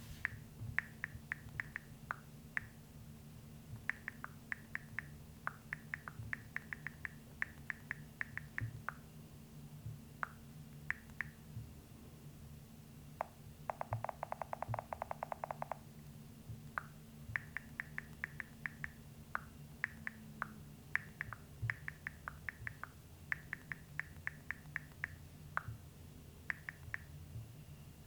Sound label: Iphone Typing